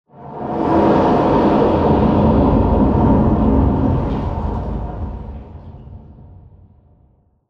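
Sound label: Explosion Rumble Interior Distant Tail; Boomtown; Titanfall 2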